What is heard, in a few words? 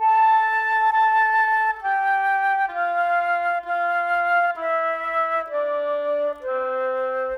Flutes, Orchestral; Sonic Foundry (Sony Creative Software) - Orchestral VOL-2; Modern 38